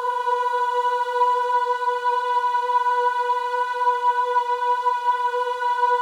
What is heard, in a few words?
WOMEN AHS B4; Spectrasonics; Symphony of Voices